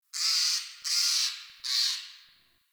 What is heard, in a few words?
Tree Critters Happy Calm; Ambience; Props; Warframe; Duviri